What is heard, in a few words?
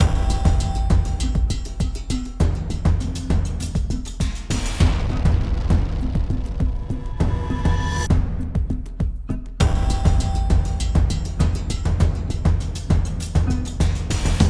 Music (Gameplay State Fight); Music Hideout1; H1 Level Area=Drying Room; Drynig Room Fight